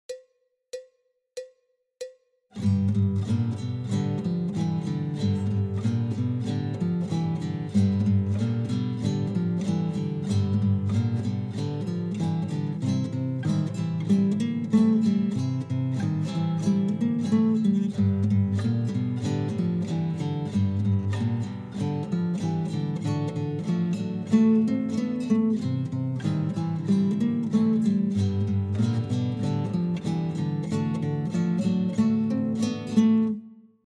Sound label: Rock Riff in G